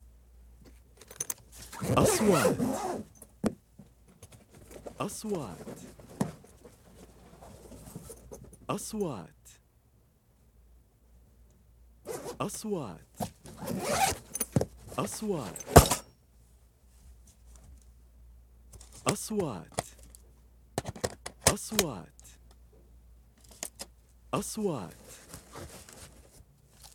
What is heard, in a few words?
bag equipments equipment bag camera shooting stuff